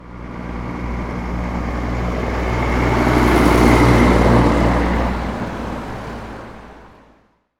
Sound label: Slow Truck Drive By